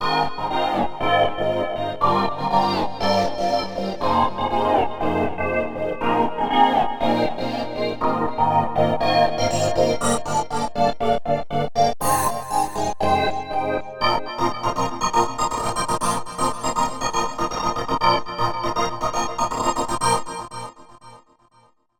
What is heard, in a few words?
winter vox